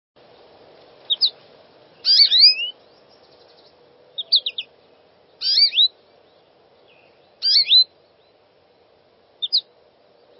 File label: American Goldfinch; Birds